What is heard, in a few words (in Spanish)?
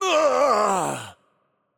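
Death, Mexican Spanish; es MX; VO; bloom minigame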